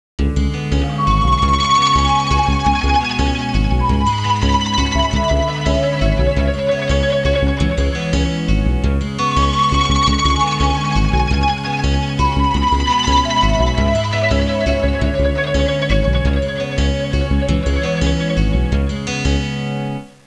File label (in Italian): musica elettronica